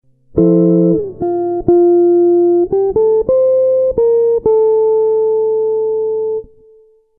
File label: chord melody guitar